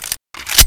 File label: clip in; kriss